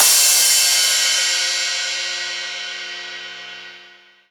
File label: Crash, Cymbals